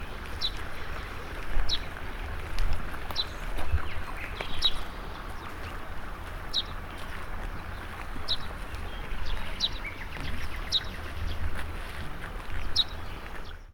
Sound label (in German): feldsperling